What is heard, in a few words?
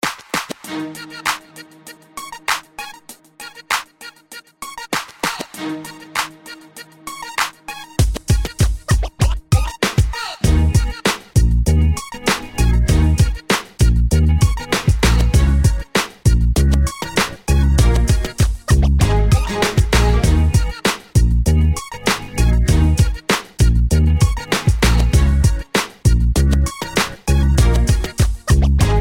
Hiphop